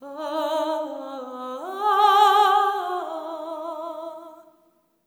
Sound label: LAMENT